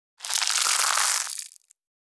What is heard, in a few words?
Baby dragon egg hatching